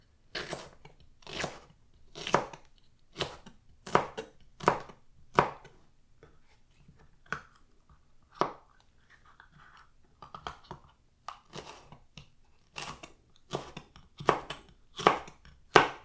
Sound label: cut green pepper; cooking